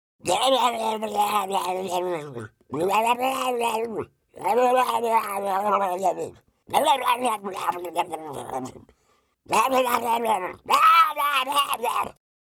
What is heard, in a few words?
Murlocesque Noices